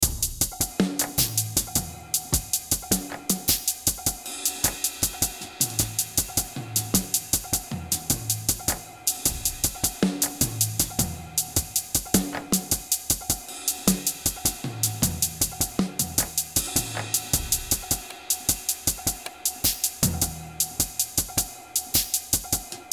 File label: drums